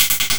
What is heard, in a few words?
INSECTS, SYNTH INSECTS; Big Fish Audio - Alien Guitars